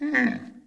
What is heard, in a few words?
Giraffe Pre Aggro